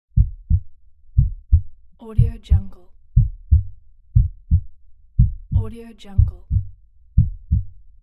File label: heartbeat 60 bpm loop; افکت صوتی ضربان قلب